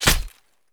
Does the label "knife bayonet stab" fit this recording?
Yes